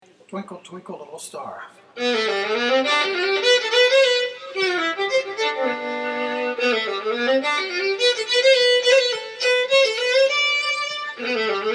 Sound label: Twinkle Twinkle Little Star, fiddle